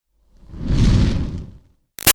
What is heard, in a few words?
Fireball Whoosh Med; Sound Design; BigRoomSound